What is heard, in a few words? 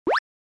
Arcade General Pitch